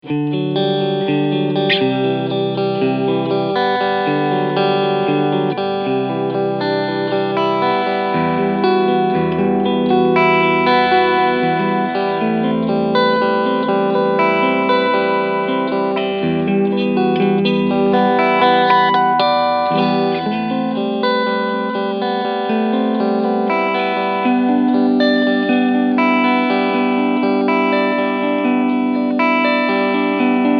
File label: Clean OS OFF